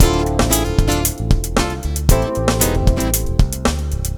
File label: POP-BLUES; GROOVES